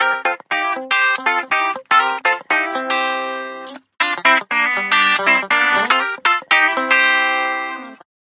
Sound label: loop the guitar